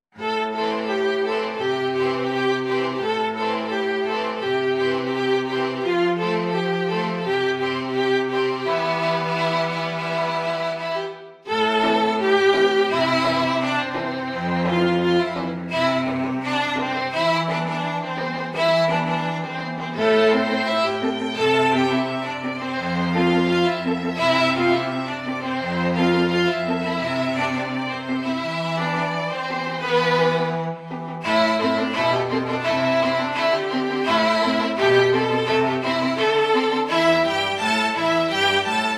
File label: STRING QUINTET